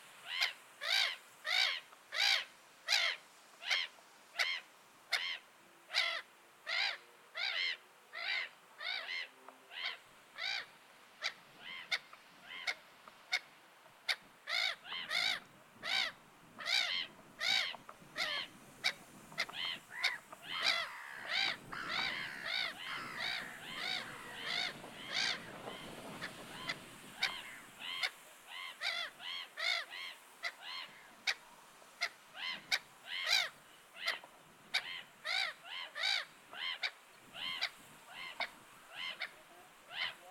dankasiraly Chroicocephalus ridibundus

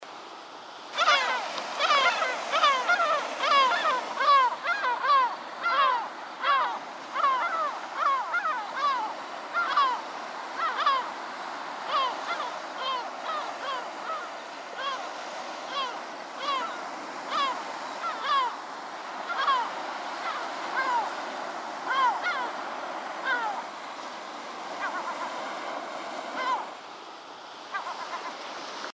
sargalabu siraly Larus michahellis

